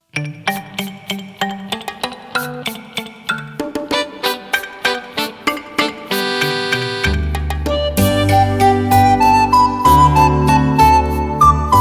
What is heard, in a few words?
recorder